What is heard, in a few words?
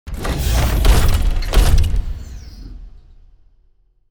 Mecha Move End; PCBS; Aorus